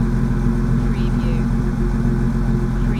Mustang 68 Fastback Engine Idle Loop - Exhaust Perspective